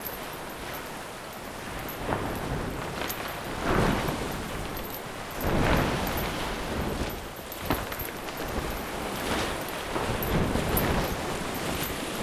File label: warship movement loop; ships siege